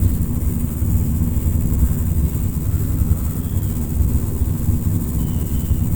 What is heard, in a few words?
Drone Ambient; Drums & Drones